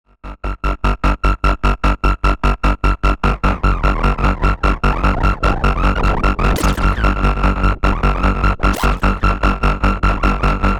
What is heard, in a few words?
Erica Synths Liquid Sky Dada Noise System 2 Tube OSC Delay